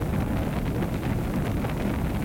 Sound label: red Flame; hiddencoinsofegypt